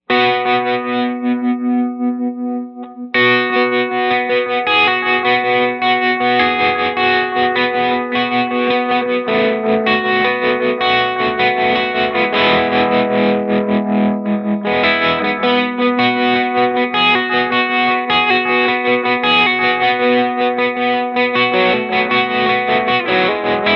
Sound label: trem jiggin, tremolo; diamond pedals